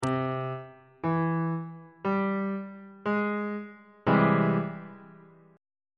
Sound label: g sharp augmented major 7th 1st inversion on bass clef